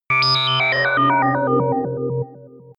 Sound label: sci-fi dark planet, digital